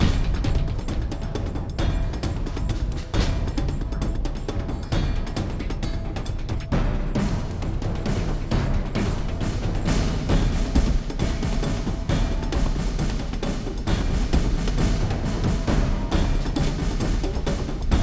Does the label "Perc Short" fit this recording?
Yes